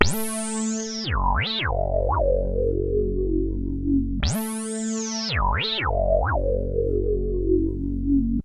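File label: RETR SYNTH, 114 bpm; USB Soundscan vol.32; AKAI; Roots Disco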